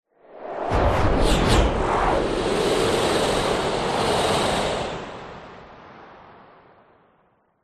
magic wind whooshes multiple; fantasy; zapsplat

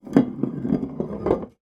foley bottle wine roll across wood table

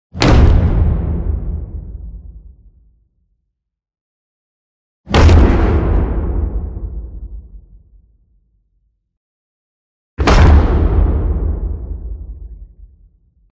CINEMATIC IMPACT Hit Wood; smartsound

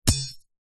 knife drop grass

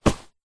foley clothing shorts thick cotton grab

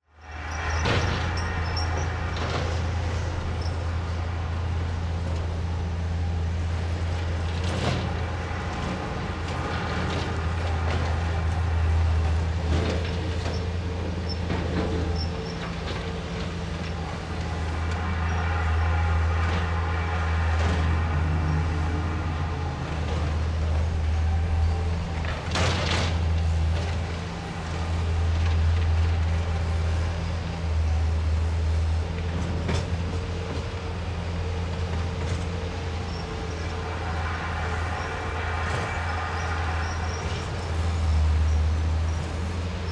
Ambience Construction Site; Just Ambience; soundbits

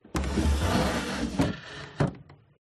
Close Drawer, Old, Wooden, Movement; PMSFX

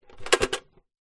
household tin jar teabags lid movement

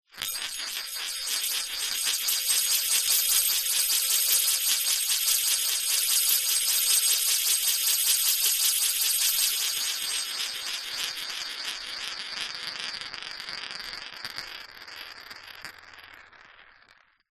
industrial ball bearing spin in metal bowl; zapsplat

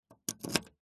vehicles car key insert lock ext 4x4; zapsplat

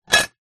household plate ceramic down clink

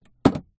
car compartment lid close; transport

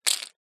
leisure poker chips handling short; zapsplat

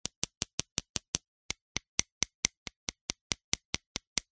industrial hammer tiny wooden hits stone fast; zapsplat

